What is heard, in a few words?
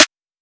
phonk snare; phonk toolkit; rocket powered sound